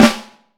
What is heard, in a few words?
FLAM, Snare Drum; Vintage Snare Drum; 5X14; Q Up Arts; Johnny.C's Vintage Snare Drum